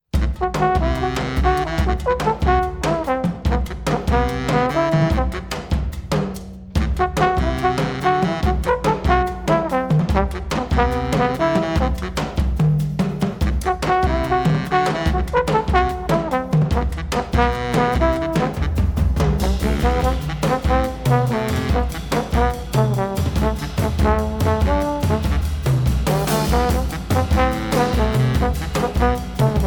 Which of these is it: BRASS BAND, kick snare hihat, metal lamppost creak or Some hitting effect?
BRASS BAND